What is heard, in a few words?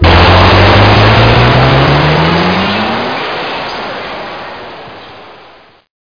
fly